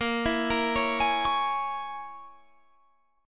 A sharp Minor 7th Flat 5th A sharp, Guitar Standard; 18,19,20,18,21,21; arpeggiated; capo=9